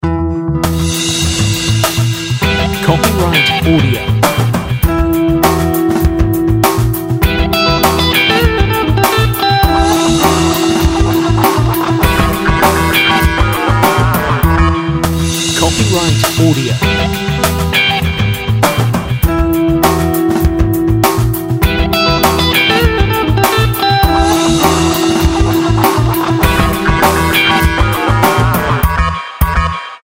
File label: bed Funk